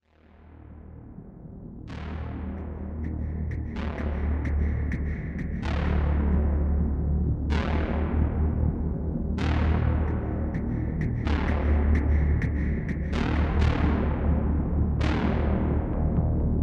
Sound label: Underground Ambient; Mass Effect; Knossos